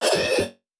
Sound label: Processed Chant Glitcher, Vocal; Cymatics; Euphoria Vocal Sample Pack